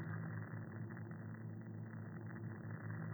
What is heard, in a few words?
gene therapy cap remove